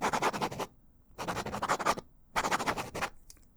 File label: Pen Cap Scribbles; bb - Books, Paper, Writing